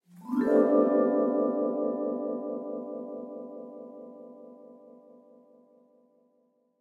Ethereal Vibraphone Chord Reverberant; Selkor Studio; Classic Recalls

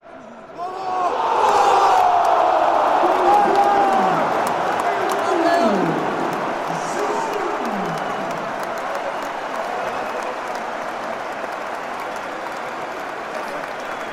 Soccer Stadium Goal Crowd Cheering Clapping; Sonic Bat

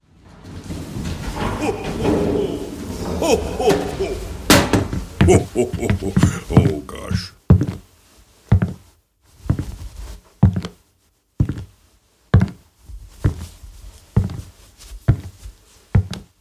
Santas chimney entrance; Scene; refocus

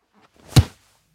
Football kick; DB studios

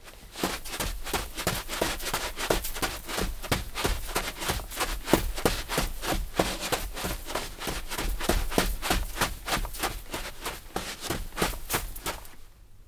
Footsteps Sneakers on Sand Running

